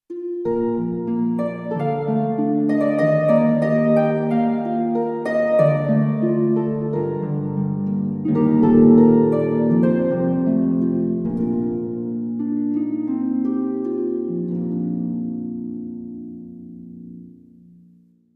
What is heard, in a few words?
pure space harp